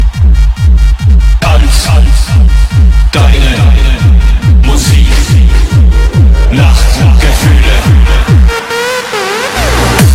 Tecktronik

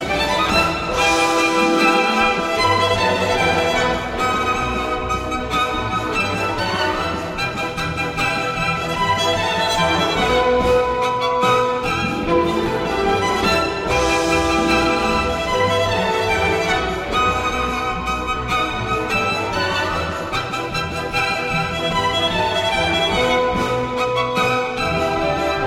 Trumpet

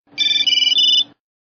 Ringtone

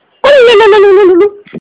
Msg Tone

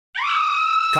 Scream

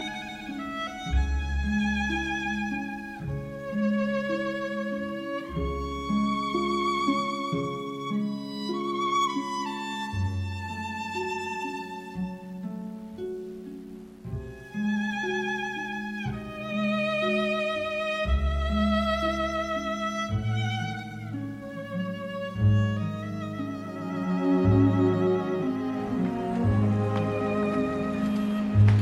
Violin; Karate Kid

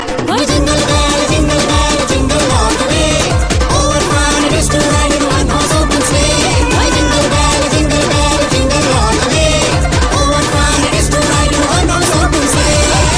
Jingle Jingle Bell

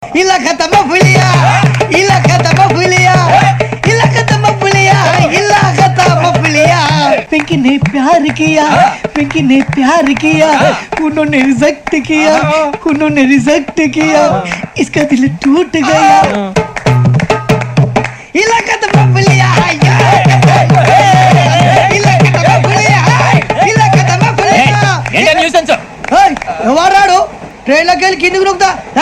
Telugu

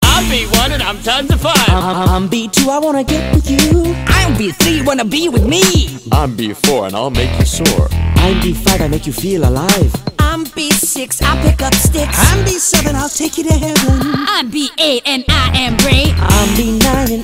Ringtone

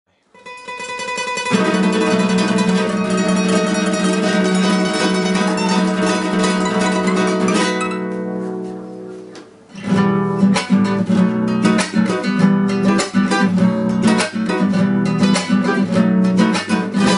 Flamenco

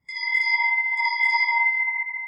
Beckoning bell

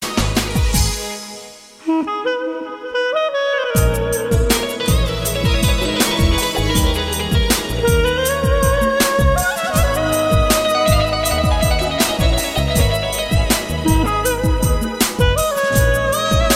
Kavkaz Clarnet

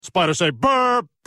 Burp

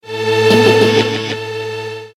Dance Sms

